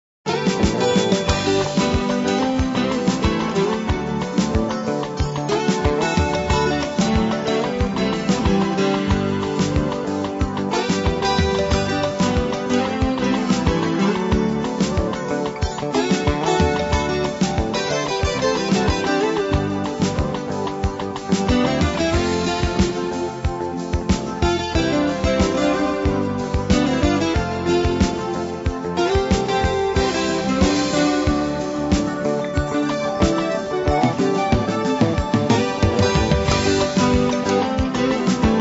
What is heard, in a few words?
kalimba